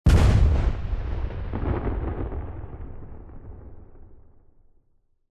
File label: Shoot; Age of Empires III; cinematics